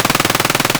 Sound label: glock18 outdoor far loop; Escape from Tarkov